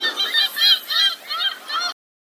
monster seagull

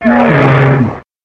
npc camel

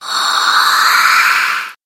monster tormented souls

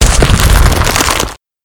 hit earth

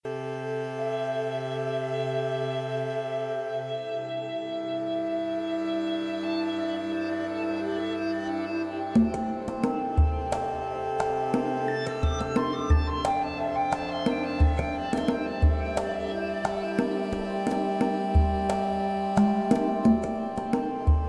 wilderness calm ocean

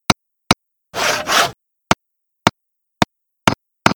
tradeskill carpentry

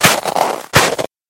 monster snowman

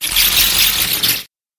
monster vampire bat

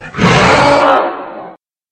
monster werewolf

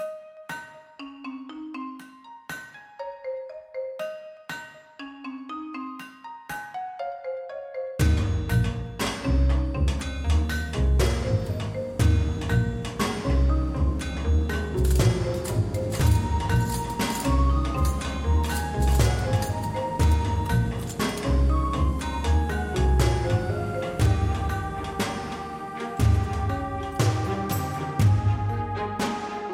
misc artisan manor

